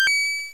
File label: heal; hyperspace-roll